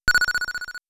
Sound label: ball bounce brick stereo